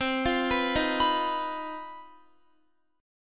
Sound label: C Minor Major 7th Double Flat 5th chord, Guitar; CmM7bb5; arpeggiated